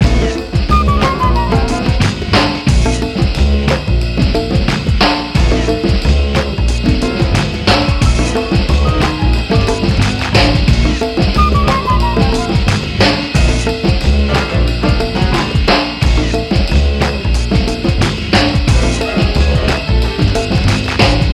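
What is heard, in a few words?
Acid Jazz Combo, Bbmaj, 090 bpm; Digidesign; Pro Tools Essential Loops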